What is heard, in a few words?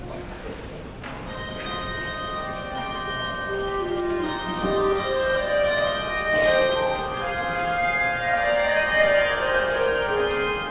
Handbells; The King of Glory Comes